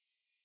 crickets, ambient

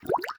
running water, river sounds

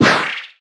dazed

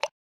running water, river sounds

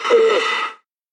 antelope hurt, utterance

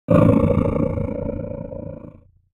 wolf angry

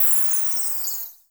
summon; bloodmoon heiress; abilities

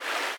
fast water, river sounds

